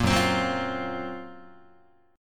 Gb Major Sharp 11th Gb Guitar; GbM#11 strummed; JGuitar

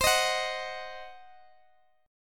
C Diminished C; Cdim strummed

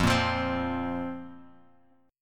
F Suspended 4th F, Bass Standard; Fsus4 strummed; 13,13,15,17; JGuitar

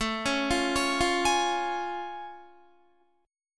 A Augmented Major 7th A Guitar; arpeggiated

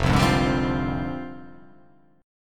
F Minor 6th Add 9th, Fm6add9 Chord; JGuitar

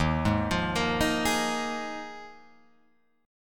Eb Suspended 2nd Suspended 4th, Guitar, Open F; Ebsus2sus4 arpeggiated; JGuitar

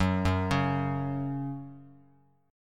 F sharp 5th, down, Bass Standard; arpeggiated; JGuitar

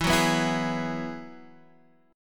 F sharp 7th Suspended 2nd Suspended 4th, E

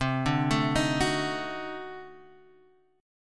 D 7th Flat 9th C, chord

